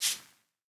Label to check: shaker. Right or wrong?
Right